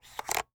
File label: Electronic Remote Control Button Sliding; AdobeCC